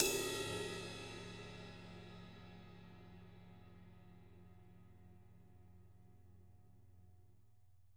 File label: RIDE; Sonic Reality